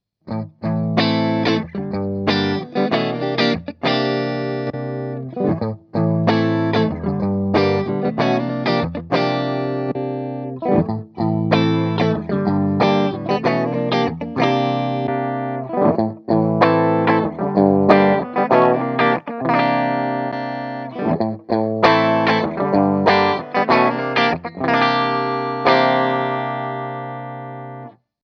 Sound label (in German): Humbucking Moduliert